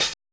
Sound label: cymbal, Roland MC-909